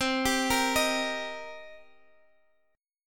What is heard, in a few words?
C Minor 7th C Guitar Half Step Down